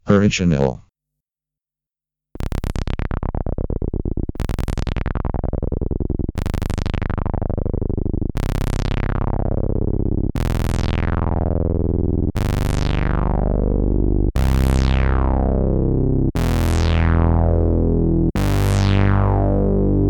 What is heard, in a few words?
MS20 Original Mini Legacy Saw Unison Mid Resonance